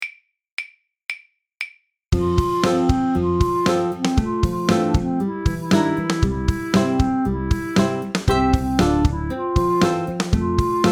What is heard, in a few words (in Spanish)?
Tocamos los tambores